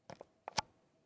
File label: Select, Game Boy Advance SP; Mod AGS-001